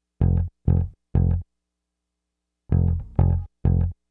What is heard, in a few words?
Bass, 70s Analog Funk